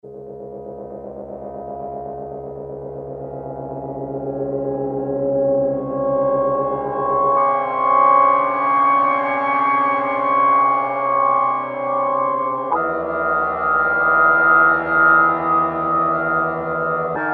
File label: SPACE, Kawai SX-240